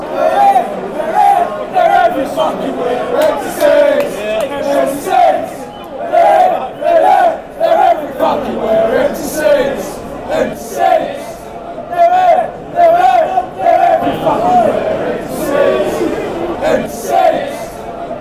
empty seats, fan chants; ipswich; Forever and ever, sung regularly, another Ipswich football song

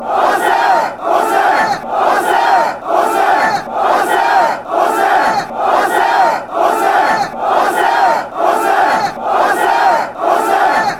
auxerre, chants

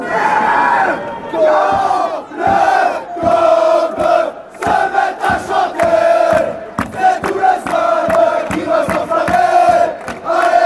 stadium, chants

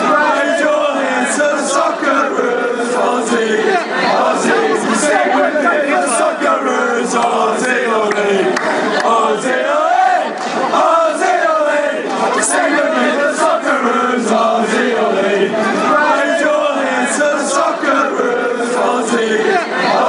chants; socceroos

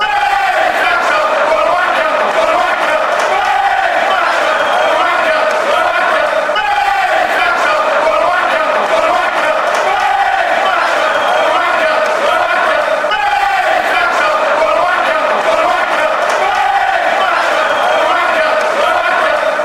fan chants; ben thatcher

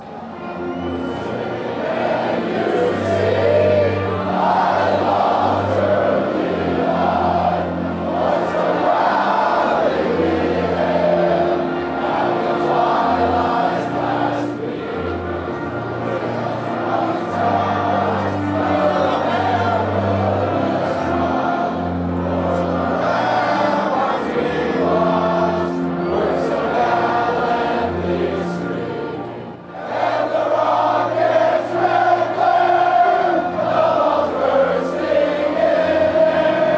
music, football song; star spangled banner; Uncle Sam's Army are coming again...

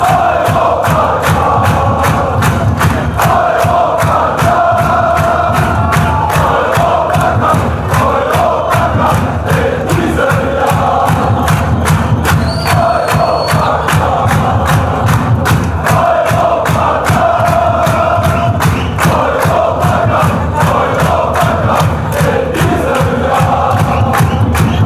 chant, fan chants; eintracht frankfurt

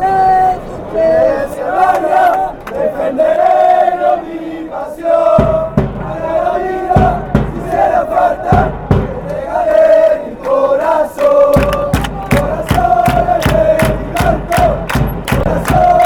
heart fanchants

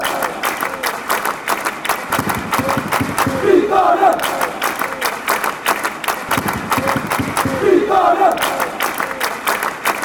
claps vitoria

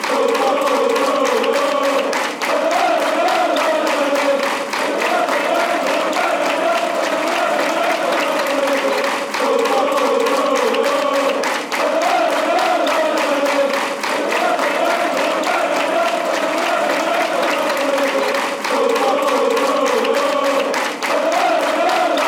woah, fan chants; swansea

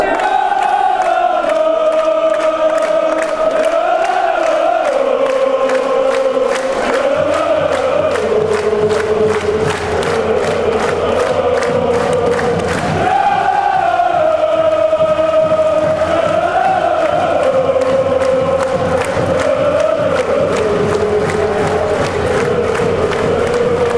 fan chants

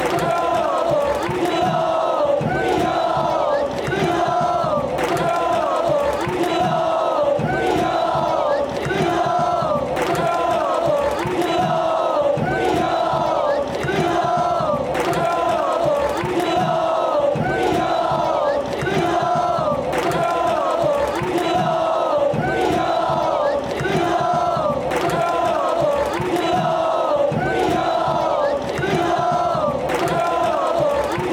fan chants; loo loo

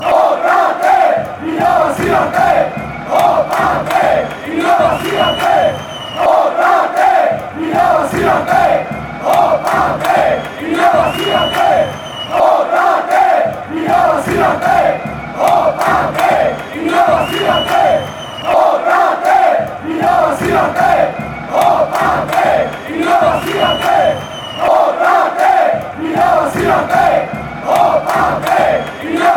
again, fan chants

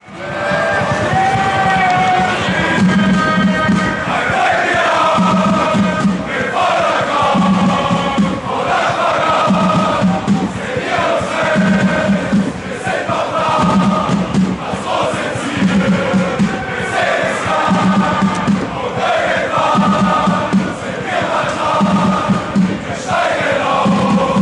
fanchants, football chants, Germany soccer fans; das alte lied vom ssv; oberliga

